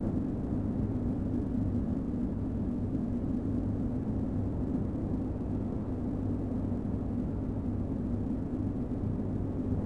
train tunnel ambience; Star Wars Obi Wan; XBOX

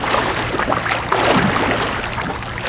swiming; people; Pirates Of The Caribbean

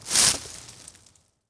bush snap; Star Wars Obi Wan; XBOX

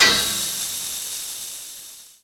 pipe hit; Max Payne

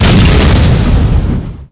Small Exp, Explosions

